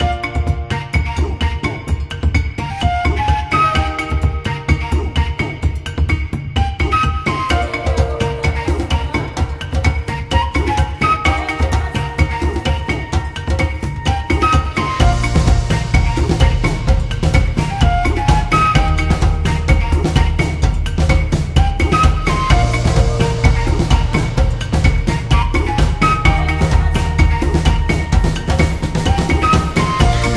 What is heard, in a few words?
Happy Africa